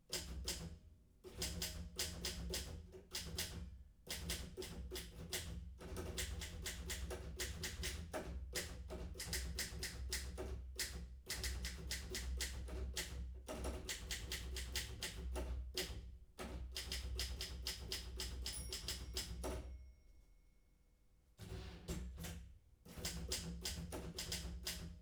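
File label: Typewriter Halda classroom medium medium distance; Pole Position; Typewriters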